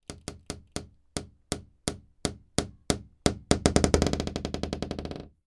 household door wooden internal creaking old very slow